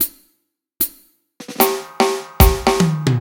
Clear Drums Intro; Reggae (Регги)

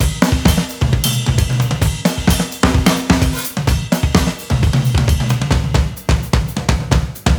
Loop G, ударные и барабаны latin; латино

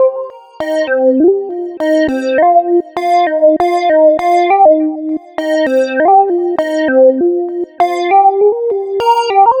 Solo Lead; 70’s Funk

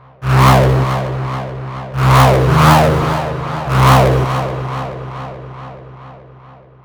Snort, hard style

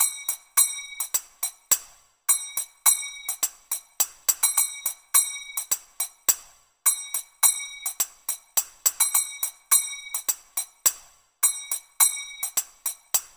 Indian Cymbal Riff 105 BPM

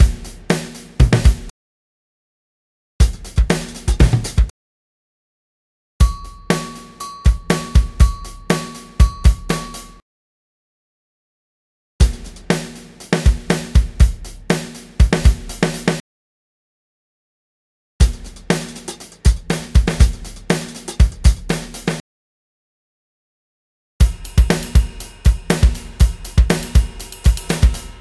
Arena Rock Drums and Fills 120 BPM

